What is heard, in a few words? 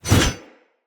One Handed Axe Whoosh Heavy; Weapons; Enemy; Axes; Sinecure One Handed Axe Elite